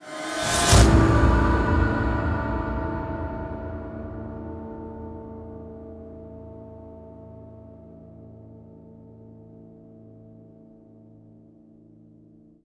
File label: Stinger